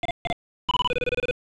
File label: Cisco phone ring